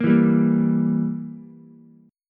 E Diminished E, Ukulele; Edim strummed; JGuitar

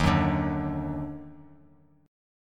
D sharp Minor Major 7th; strummed

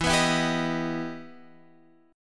E Minor Major 7th

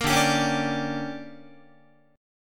D Major 7th Suspended 4th Sharp 5th, Guitar; DM7sus4#5; strummed; JGuitar

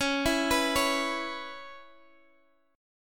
C sharp Minor 7th C sharp Guitar Standard; arpeggiated; capo 9

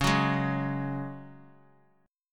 Db Suspended 4th Db; strummed

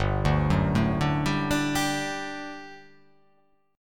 G Major 7th Suspended 2nd G down

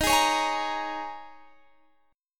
Eb 7th Suspended 4th, Guitar, Half Step Down

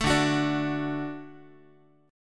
D Major D down Guitar; 12,10,9,12,x,x

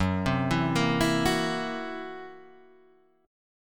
Gb 7th Flat 5th Gb Guitar Half Step Down

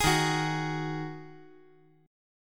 D sharp Minor Double Flat 5th; strummed

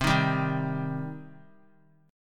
C Minor C down; strummed; JGuitar

